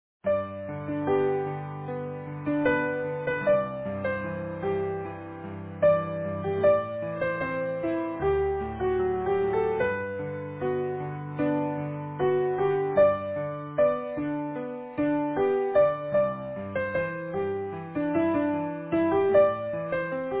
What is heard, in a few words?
Easy Piano